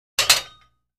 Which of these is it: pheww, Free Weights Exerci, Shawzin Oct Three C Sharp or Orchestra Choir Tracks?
Free Weights Exerci